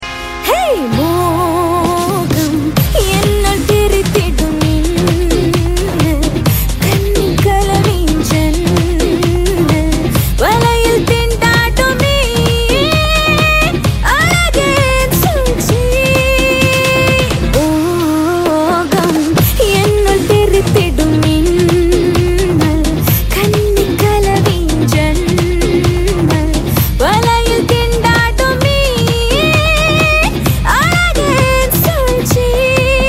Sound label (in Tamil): Tamil Song; KGF